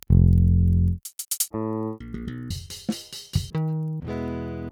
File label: Pultec; Virtual Analog